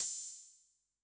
Open, HiHat; Yamaha DTXpress-II

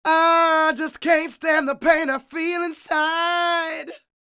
SING, Vocal Planet Blues Soul BLUESY

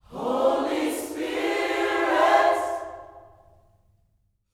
H SPIRIT, Gospel

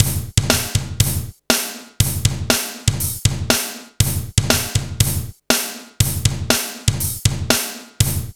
ROCK, Funky; INIS

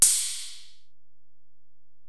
Ride, Alesis DM-PRO

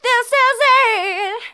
Female vocals and robo-vox

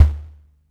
LOW DRUM, BASS DRUMS; Zero-G Ambient 1

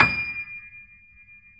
D 6, Keyboards Acoustic, GRANDP ST; USB Soundscan vol.17

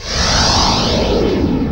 NOISE, Production Effects

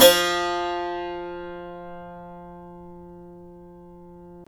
saz F0D; Roland - LA Composer vol. 3

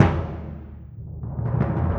Bass Drum, Orchestral Series Rock and Pop; Sonic Foundry (Sony Creative Software); Orchestral Series VOL-4 - Rock and Pop

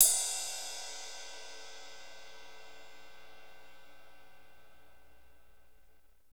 CYM cymbal, Power Ballad Cymbals; Northstar; Drumscapes Roland

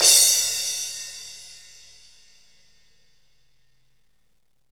CYM TEK, Roland; Northstar - Drumscapes Roland

